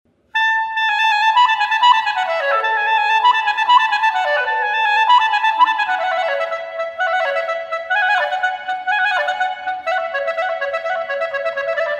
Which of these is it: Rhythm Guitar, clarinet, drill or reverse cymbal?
clarinet